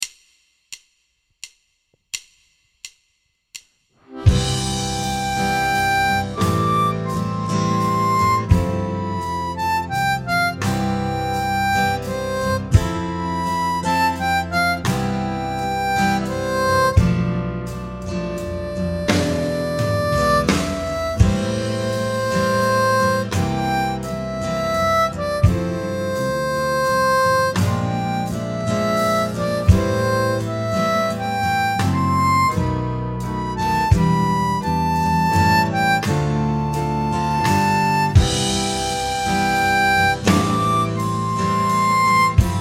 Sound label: 85 harp